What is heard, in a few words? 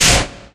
door malfunction open